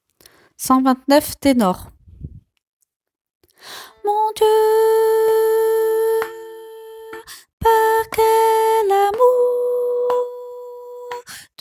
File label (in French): ténor